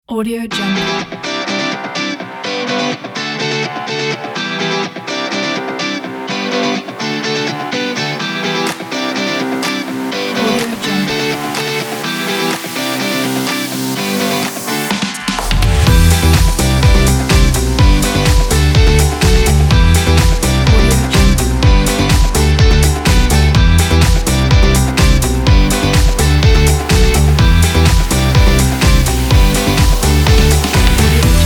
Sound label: energetic summer pop